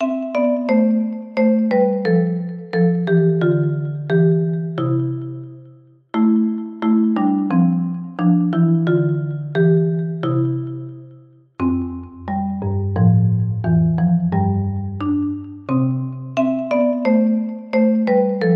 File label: Like a animals cute marimba